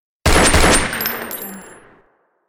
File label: pistol shooting